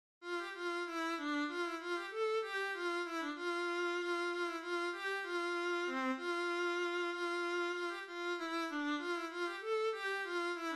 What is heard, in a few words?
Estampie viola